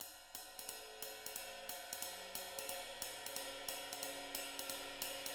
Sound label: SWING; Sampleheads; Peter Erskine Living Drums